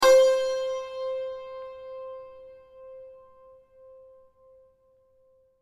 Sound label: santor do